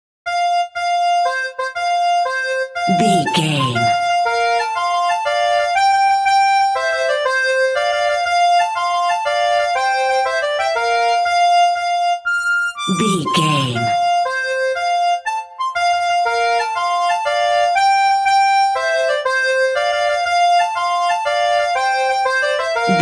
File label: accordian, Squeeze Box; Here We Go Round The Mulberry Bush Squeeze Box